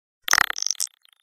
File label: artifact activation fail; tbd-station-14